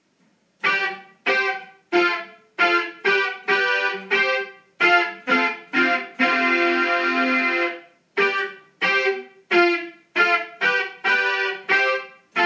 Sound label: jump